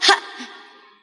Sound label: "ha", Voice